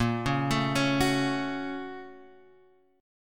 Bb Minor Major 7th Sharp 5th, Guitar, Open G

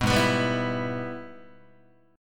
Minor Major 7th Sharp 5th, Guitar; G#mM7#5; strummed; 2,4,2,5,3,3; 4A,4E,5A,5D,5G#,6C#

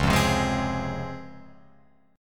Db Major 7th Db down Guitar Open F; DbM7 strummed; JGuitar

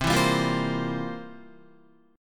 C 11th C down Guitar; C11 strummed

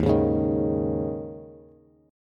D sharp 7th Suspended 4th, Bass; D#7sus4 strummed; JGuitar

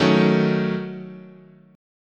Eb Minor 7th Flat 5th, guitar chord

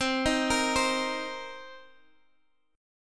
C Minor 7th, Guitar; Cm7 arpeggiated; 20,18,20,17,x,x

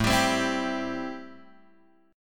A-Minor 7th A Guitar Open G

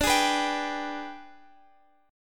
Ab Minor Major 7th Flat 5th

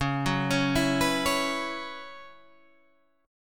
B Suspended 2nd Suspended 4th

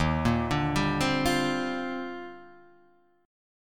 D sharp Major 13th D sharp Guitar DADGAD, chord; chordshape

